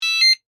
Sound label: Emergency, Medical, Self-Contained, Breathing, Apparatus, Single, Beep; Hospital Sound Effects